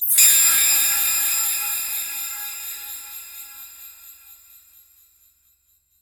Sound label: sharp crystalline digital, sweep